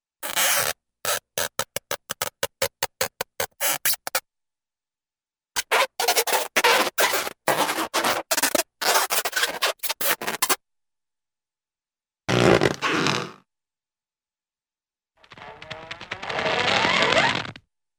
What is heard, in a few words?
Long Struggling Twisting Rubbery Stretch; Cartoon; Hollywood Edge; Cartoon Trax